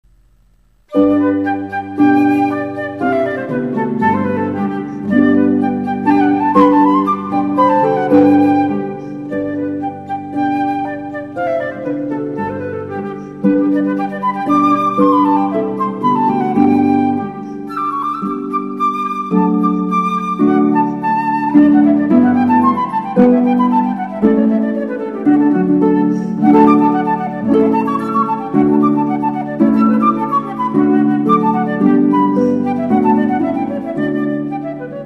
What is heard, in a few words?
rondo presto, harp